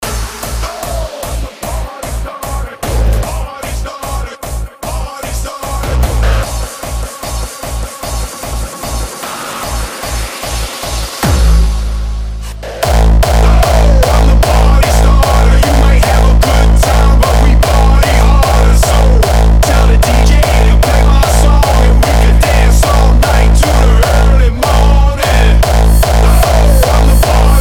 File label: Partystarter Headshaker Kick